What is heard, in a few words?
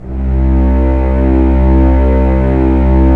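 STR SC, strings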